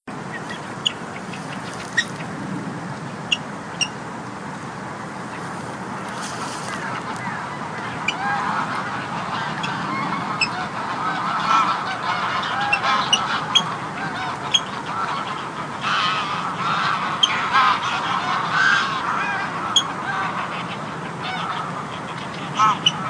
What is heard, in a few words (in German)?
Wasserralle